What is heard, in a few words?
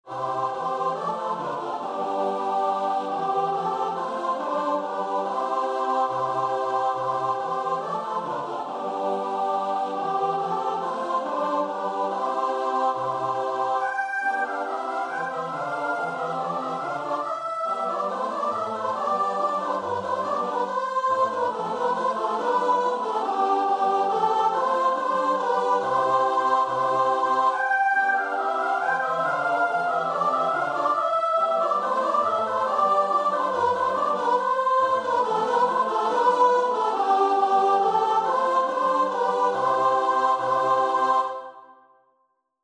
ding dong merrily, choir